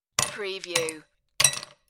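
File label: Ice Cube Throw Three; General sound effects heard in bars and restaurants from glasses clinking, mixing drinks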